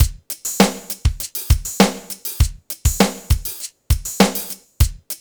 ROCK, Funky Xtreams; INIS

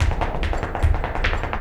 Flamenco Tapping Zapateado Solo; Best Service ProSamples vol.46

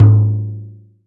OPEN HAND, Timbale; Timbale Battery

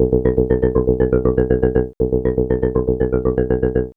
POLYMOD; AMG - Vince Clarke Lucky Bastard

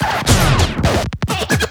Fills, Loops 140 BPM

